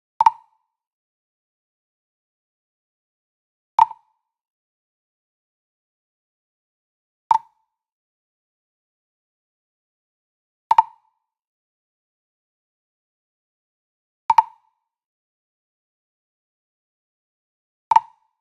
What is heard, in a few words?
Sweetener Jam Block Plastic Flam Near; boom library; Cinematic Strikes Construction Kit